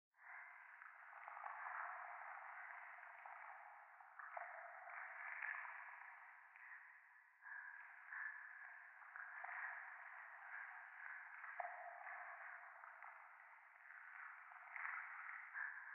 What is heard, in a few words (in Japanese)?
右耳快感吐息 触手; 廃墟